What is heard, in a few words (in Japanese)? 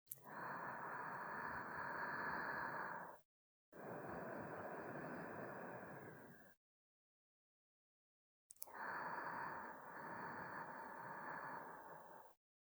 寝息ループ, ASMR